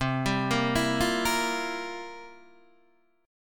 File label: C 11th, C, down, Guitar, DADGAD